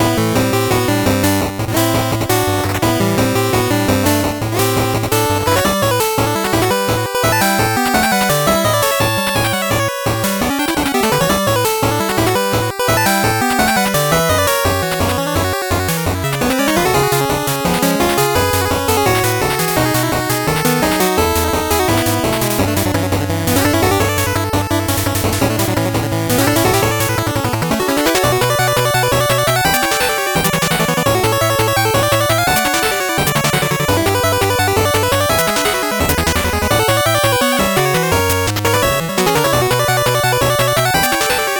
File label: 8bit bgm normal